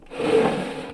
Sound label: drag furniture